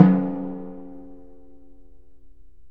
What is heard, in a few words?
DRM, Orchestral Perc; Roland LCDP03